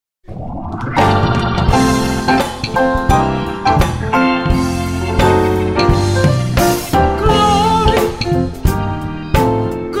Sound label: Lenten Gospel Acclamation